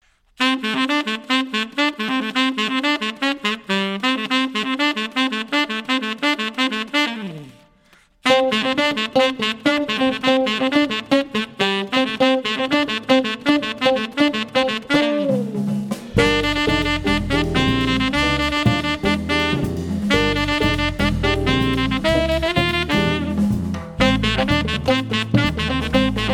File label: quartet, latin ensemble